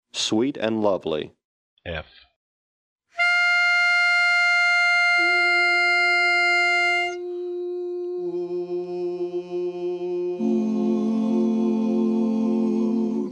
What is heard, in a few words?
Bari, barbershop quartet